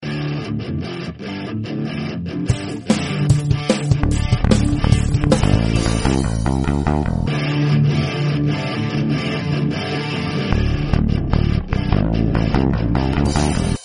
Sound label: Heavy Rock Metal